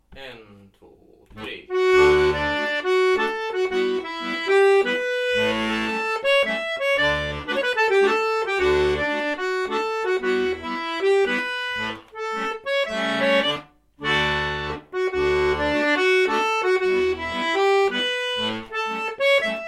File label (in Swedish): Dur och moll